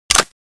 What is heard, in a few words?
clip out, weapons